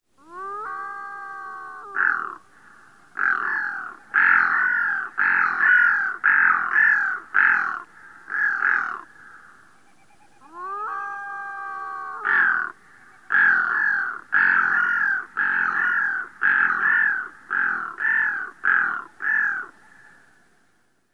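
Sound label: Pacific Loon, growl duetting; AK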